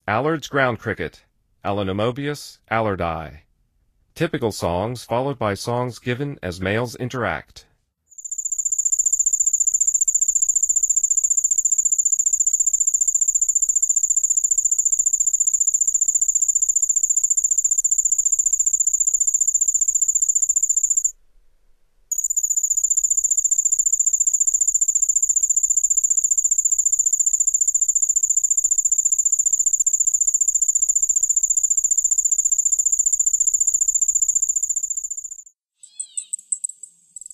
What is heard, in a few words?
Allards Ground Cricket, Songs of Insects